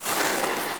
Ski Turn; Misc Sports

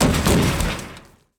Bodyfall Hollow Metal; SFX Kit; Bodyfalls

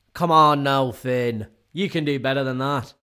Boo; Pitch Black Audio Pong

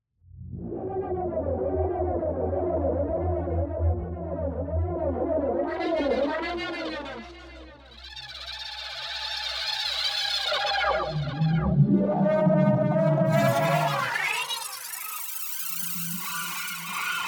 MOVEMENT Accelerated; NEON; Accelerated Reality; boom library

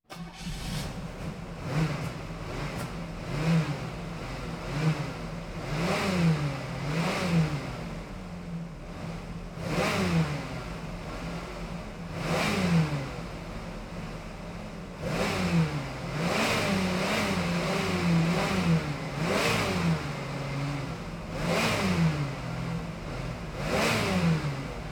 Automobiles various

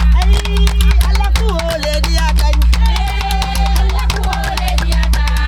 africa chant lagos; best service Blue Box

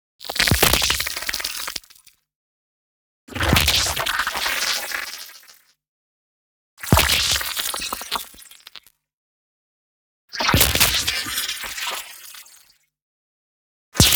NEON ZAP Blow A Fuse; boom library

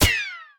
gun miss; Blind Drive

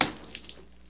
Blood Splat; SFX Kit; Foley - Misc

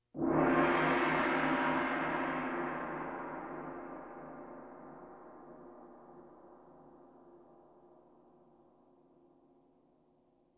Percussion Bongos Tympani rolls Gongs Drums

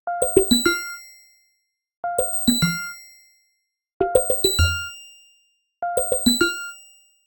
Arcade Click Positive

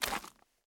zombie footstep mud; DeadFright

